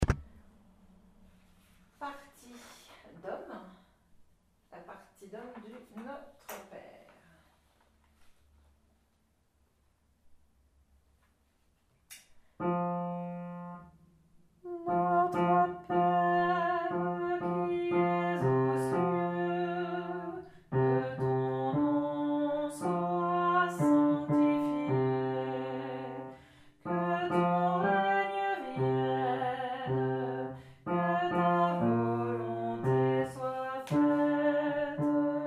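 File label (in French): Hommes, Choeur; Notre Père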